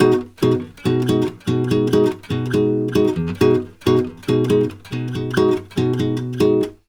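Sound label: bossa B, 140 major chord; USB Soundscan; AKAI; Brazil Bossa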